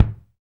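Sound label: bass drum, BD Real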